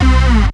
303-Style single hits

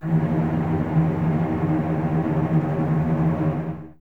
D#2, String Ensembles Cellos Tremolo; Miroslav Vitous

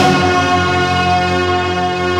HIT ETHNIC; AMG - Now CD-ROM (Roland)

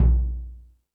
More Toms, E-MU Pro-Cussion

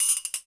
Perc Toys; Spectrasonics; Supreme Beats; World Dance

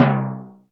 TIMPANI, PERCUSSION; Crypton - DrumBase5000; PERCUSSION#1

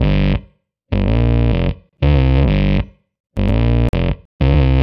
philter (phunk); misc; Sonic Foundry (Sony Creative Software); Ugly Remnants VOL-2

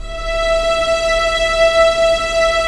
STR, Roland LA Composer

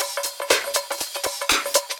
AO 120, Dance Grooves; Best Service; Dance Mega Add On Grooves

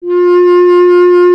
B. Clarinet

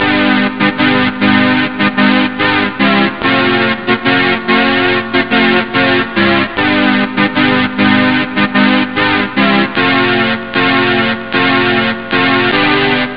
synths; ST-XX Amiga Soundtracker Sample Packs

